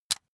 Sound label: razor rickety fold out